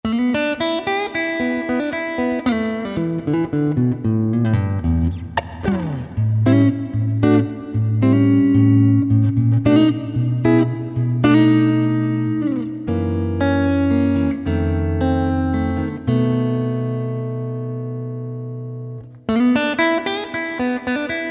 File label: Crush35LDX Clean Fender Strat